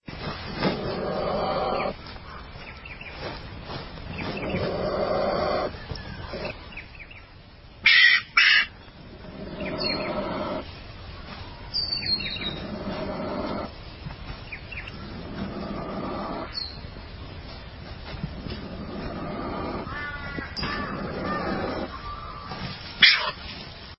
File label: spotted tailed quoll